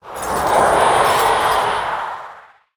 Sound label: Ice Walker Play